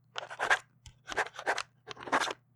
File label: Game Saved Writing And Scribbling Sound